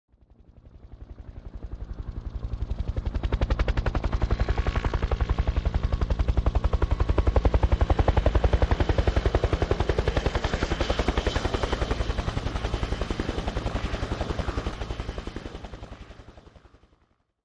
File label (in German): Helikopter Normal